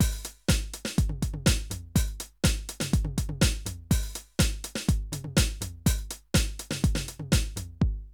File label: drum loops, House & Garage